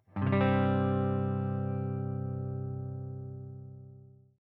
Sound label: C Chord Power, guitar